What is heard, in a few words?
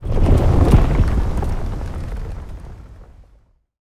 ground divide rumble